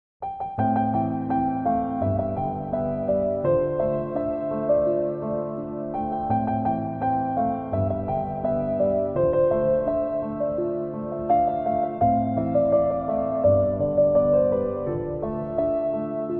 Piano Karaoke Cover

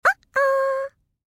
cartoon oh oh

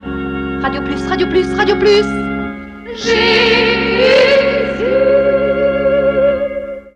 jingle radio; radio plus

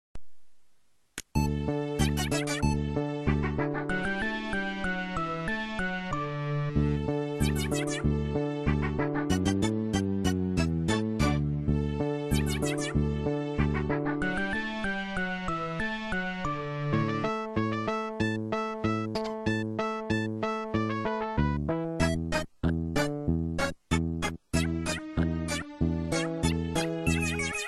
Mario Paint Composer Musette in D; Bach

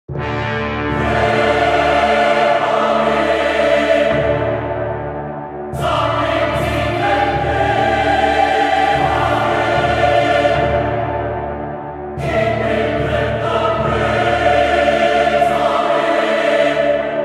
intense dark fantasy music; the first beast; dark souls playthrough ost

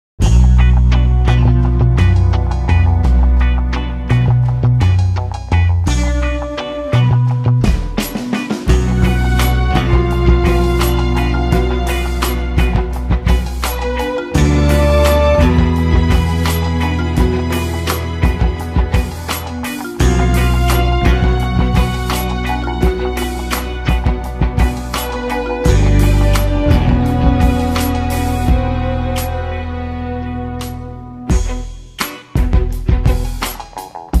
Theme Instrumental; Despicable Me

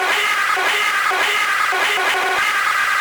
vocals only; friday night funkin no more innocence ost

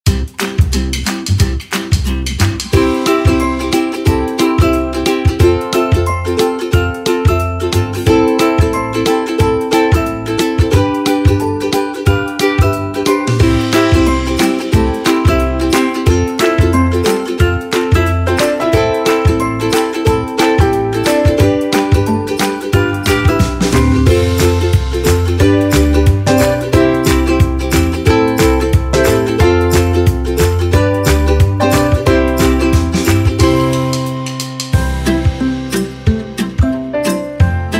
Children's Music Instrumental Music For Kids

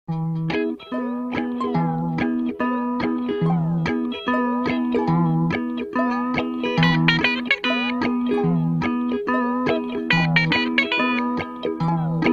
Closing Theme Song; SpongeBob

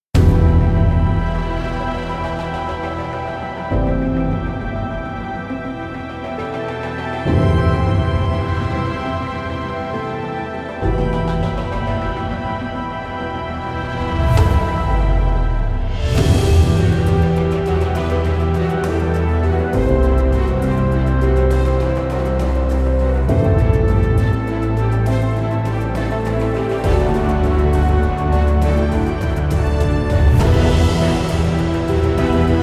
epic power

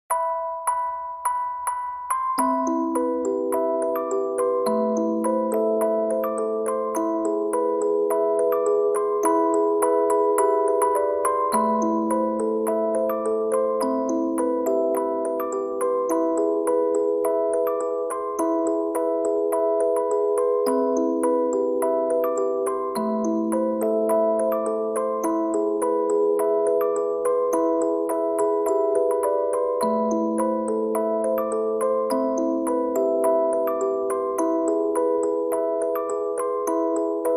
Music Box

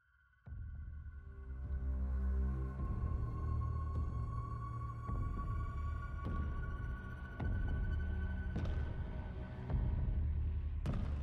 musik ambianss

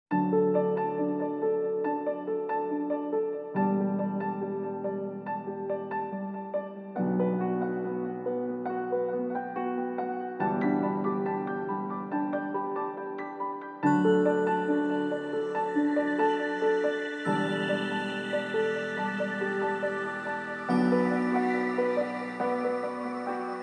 70bpm; Purple Planet Music

